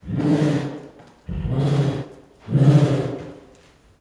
Female Bengal Tiger at Zoo, with Many Raspy Chuffs and Paws Moves; Tigers; Sound Ideas